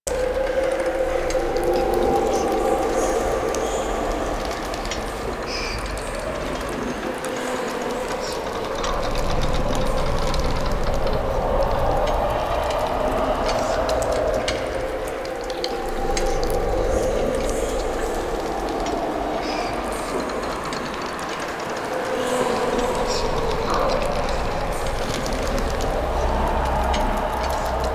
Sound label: Amb, Ambience; Dagath; Warframe; Clan Dojo